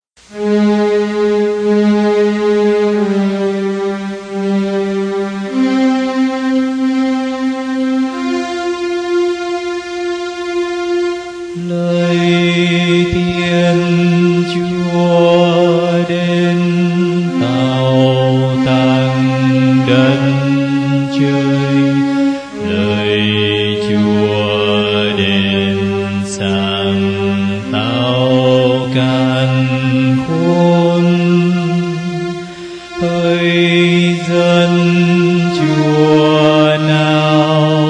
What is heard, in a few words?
BASS